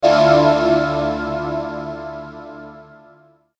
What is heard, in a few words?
Trance Sweeps; VEC2